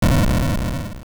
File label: Ground Crumble; The ground crumbling under Link